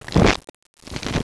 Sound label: shuffle, cards; Seahaven Towers™